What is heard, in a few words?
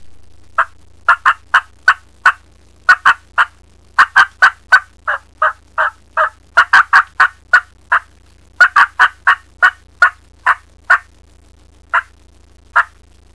cutting, Turkey Sounds